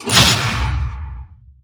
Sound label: hook target; weapons; dota; pudge